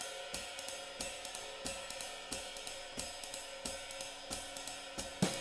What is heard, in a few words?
SWING; Sampleheads; Peter Erskine Living Drums